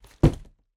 cardboard box with cellophane packaging inside put down on table; foley